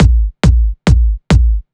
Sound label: Kick 138BPM; Drums & Perc; Kick 4 4; Vengeance Samples; Nightfly Kit; D minor